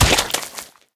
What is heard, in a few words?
hit